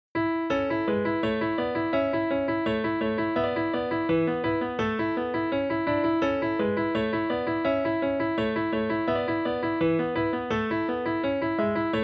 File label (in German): Schwaebisches Bauernlied Lied per voce e pianoforte.; Schubart